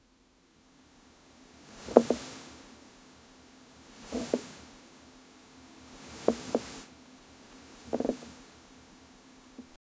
wood sliding softly over, cloth

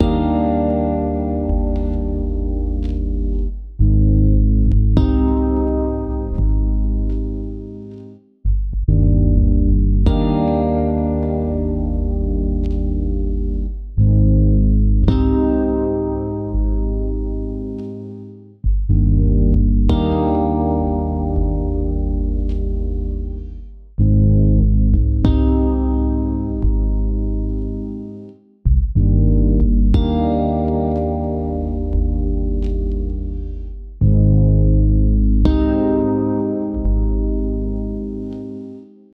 chill funk 95 bpm

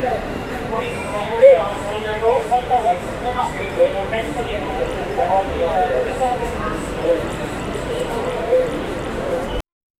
ambience of a busy indian; announcements

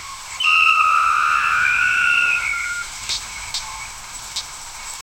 metallic clatter of wasps; insects

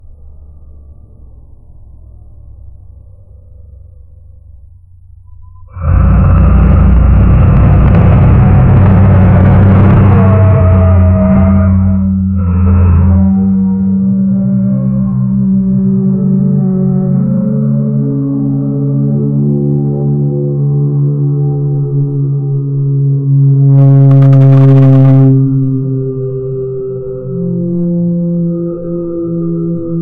very low frequency hum; amplified

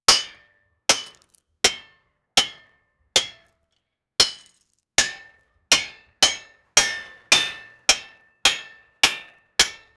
create me a runescape min, mining

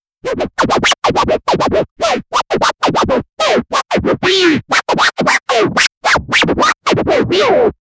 scratching

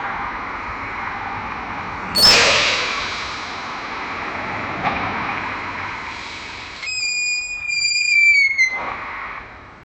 at the beginning we hear, wreck

